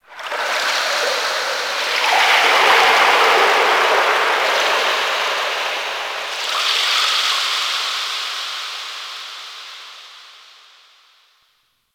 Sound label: Ocean Underwater Texture Small; Warframe; Grineer Ocean